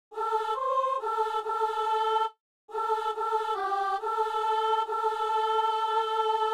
exauce nous seigneur de gloire, soprano

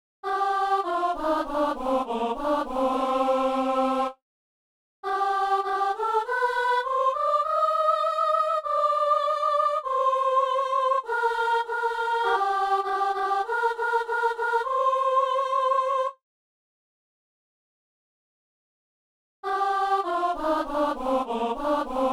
dames; Chante alleluia au Seigneur

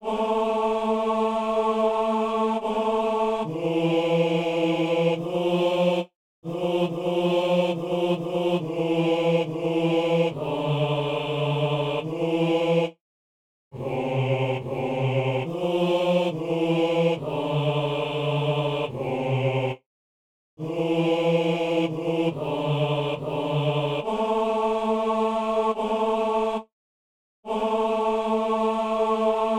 basse; Messe de Saint-Paul - Agnus

